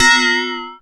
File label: A#, Synclav FM PERCUSSION; Big Fish Audio